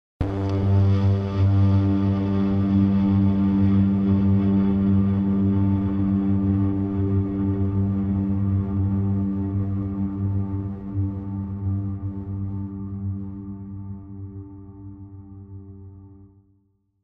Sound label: Scoring Bass Pads Complex